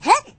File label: Jump, voices; hedgewars